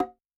Log H; Yamaha DTXpress-II; Perc

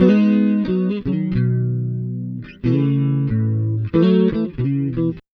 BLUES, Acoustic Guitar Loops

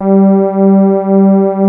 Prophet 5 G4, Synthesizer; Hollywood Edge - Giorgio Moroder Rare Synthesizer Collection

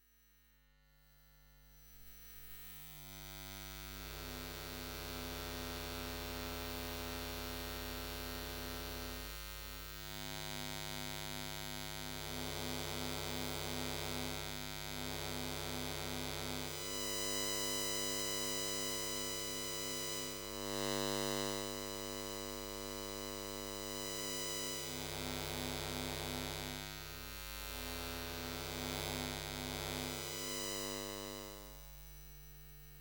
Electromagnetic Fields, Hummin; electric fields

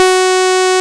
ACID F#4, Dance

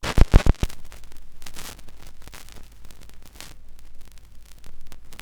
vinyl; Masterbits; Vinyl Attack

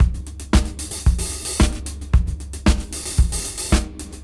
113 BPM; AMG; Keith LeBlanc Phat Butt Jams

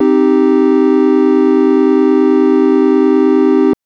Synth Triangle min c3; TrashLibrary

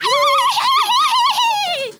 FEMALE RAVE; Spectrasonics; Heart of Africa

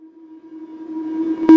SHORT, SHORTNOIS

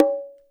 BONGO L; InVision Interactive; Percussion Slam

